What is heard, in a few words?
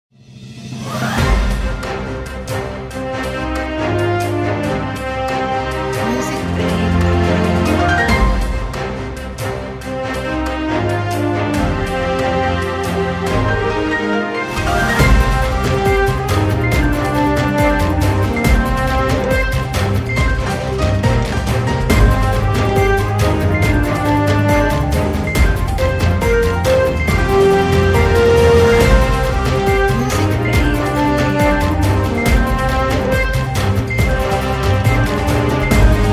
Breaking News Opener